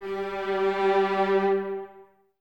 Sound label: String Ensembles, Violas, VLS Detache